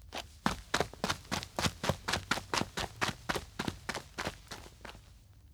Boots Running On Dirt; Fairlight Sound Lib

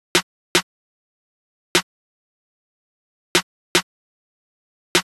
Drum Loop 150 BPM Snare, Trap; Alterations; Cymatics; ANALOG EVOLUTION Beta

